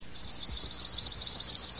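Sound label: chipping sparrow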